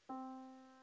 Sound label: yamaha Disk